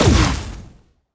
Sci Fi Explosion

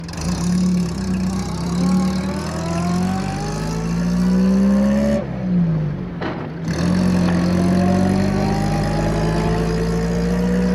Car Loop; android; Does Not Commute

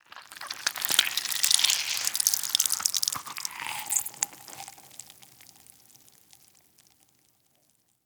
eating

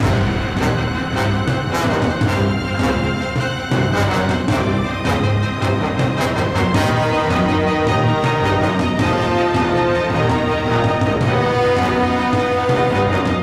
String; The Bureau XCOM Declassified

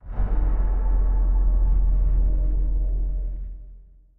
Level Start; Archaica The Path of Light; PS4 Switch Windows Xbox One gamerip 2017

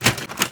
Close Tray

